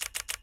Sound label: camera shutter; Hisense U961